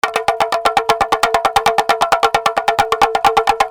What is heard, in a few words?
RHYTHMIC